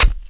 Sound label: STAB INTO FLESH